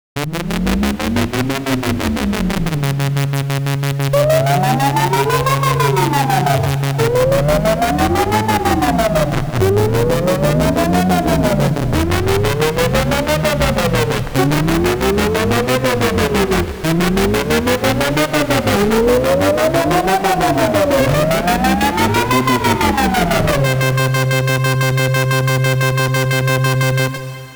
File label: funny pitch seq; the digital life